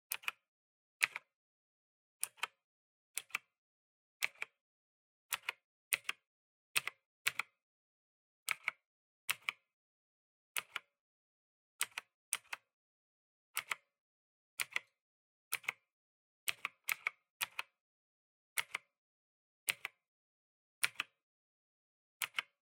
PC Keyboard (Gentle)

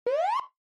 Warning Siren Low Single

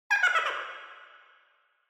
Horror Laugh Reverb

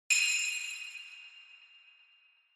Bell Accent, Dry

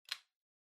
Light Switch-American Toggle (Dry-Gentle)

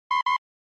Retro Anime System

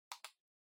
PC Mouse Far Side

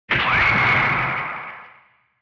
Retro Anime Accent High

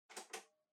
Portable Stereo Far Tape Pause

